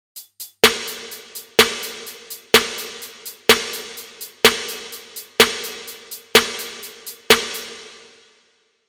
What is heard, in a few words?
Snare normal rev